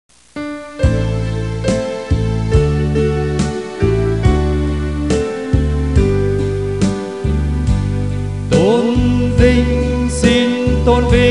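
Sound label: soprano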